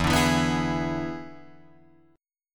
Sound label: E 7th E down Guitar Drop D